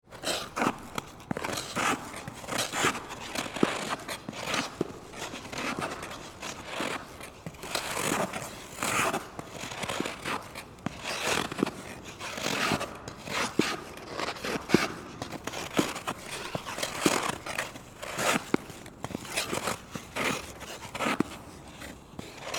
Ice Skating, Sports, Ice, Skating, Amateur, Indoor, Ice, Rink; Blast Wave